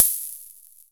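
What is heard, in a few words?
UNIVOX open hat, Univox Micro-Rhythmer 12 drums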